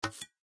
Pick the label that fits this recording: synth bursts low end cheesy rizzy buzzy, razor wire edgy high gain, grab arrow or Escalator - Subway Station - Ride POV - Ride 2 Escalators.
grab arrow